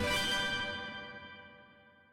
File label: count Up End; codex of fortune